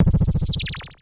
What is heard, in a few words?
Klone Dual-Percussion-Synthesiser; EFX